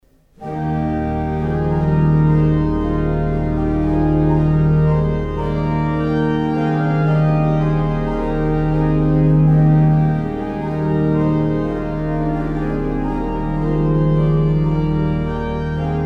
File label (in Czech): varhany; litomerice